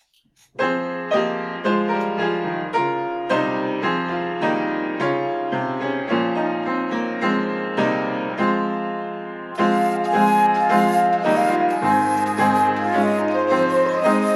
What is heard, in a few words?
piano flute